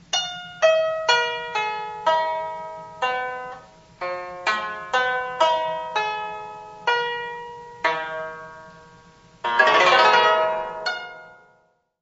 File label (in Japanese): 箜篌